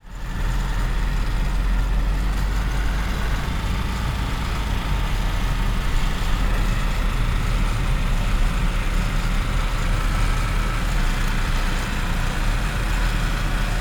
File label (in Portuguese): Motor rocadeira; maquinas; colecao sonora do cerrado